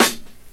acoustic snare a sharp key; snare drum samples

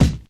original steel kick drum, B key

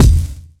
bright steel kick drum sample e key; kick drum samples

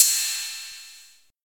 crash sound A Key; cymbal drum samples

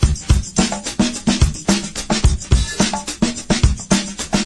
108 bpm drum loop c key, kick tuned to the C note

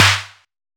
focused acoustic snare sound g key; snare drum samples

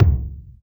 dope bass drum single shot d sharp key; Duration 0:01; Samplerate 44100 Hz

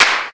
clap sound a key; percussion; single hits one shots

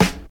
original snare f sharp key

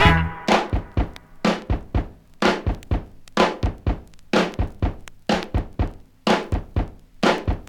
125 bpm high quality disco breakbeat e key

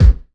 old school natural kick sound a sharp key; kick drum samples

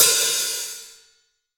tamed open hat c sharp key

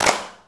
clap e key; percussion; single hits one shots

